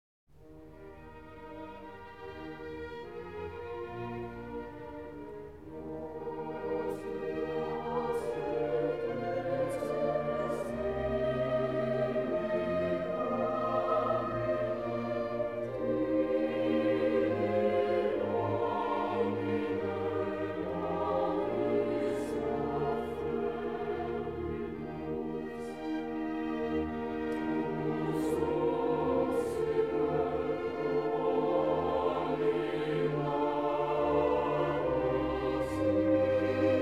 Requiem in D Minor, K 626 X. Offertorium Hostias et preces; Mozart, Wolfgang Amadeus